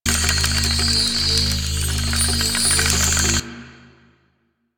Lift Move Loop; Duviri

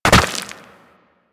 Land Soft Rock, Footsteps; Warframe; Operator

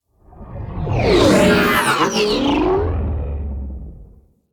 Sentient Ship Close Fly By; Warframe; Enemy Ships; Space Fighter Sentient Coil Agent

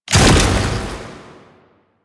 Shield Activate; Warframe; Nineteeen Ninety Nine; PvPvE

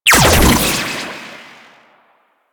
Shock Rifle Primary Shot; Warframe; Weapons; Corpus

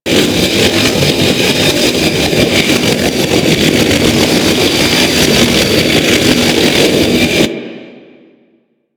Movement Slow Engine Loop, Vehicles; Warframe; Archwing

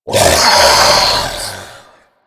Infested Femur Shoulder Fire Start; Warframe

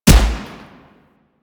Shot; Warframe; Void Trader

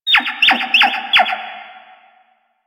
Cave Creature, Ambience; One Offs; Creatures; Warframe; Quests; The New War; Drifter Camp

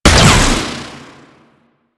Shield Lancer Pistol Alt Fire; Warframe; Lasrian; Lasrian Soldiers; Weapons

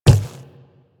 Inf Quadruped Medium Walk Default, Footsteps; Warframe; Infested

